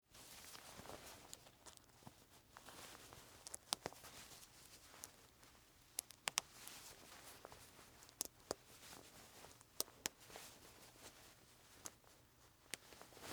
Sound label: Short Sleeve Button, Clothes, Shirt, Snaps; Foley; Dressing Sound Effects; BlastWave